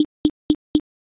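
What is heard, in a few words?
footsteps leather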